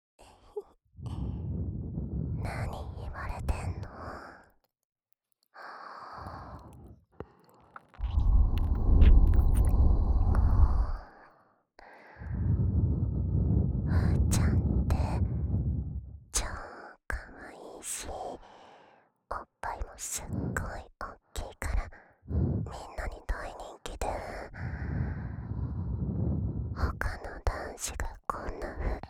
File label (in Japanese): お耳ふうふう; おっぱいサンドイッチ; がっつり性欲全開暴発射精♪